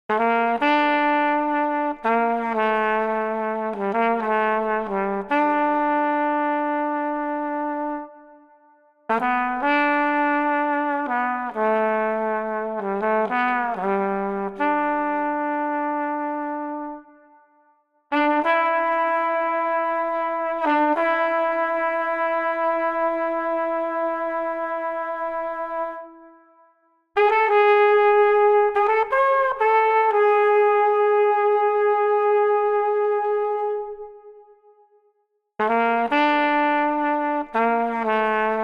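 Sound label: Street Flugelhorn Mournful, 120 bpm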